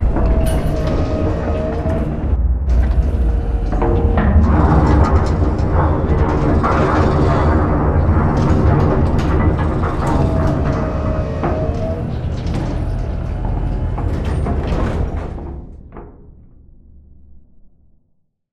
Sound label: dog whistle pulse success mech med dist; boneyard; Titanfall 2